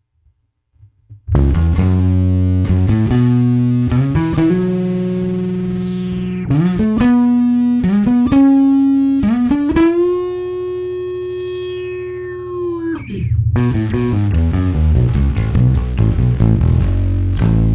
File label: Bass Solo; Phunked Up Funk